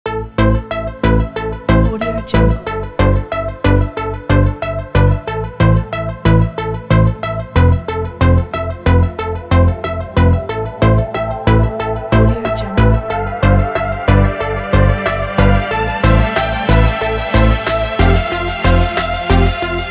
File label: inspiring trance corporate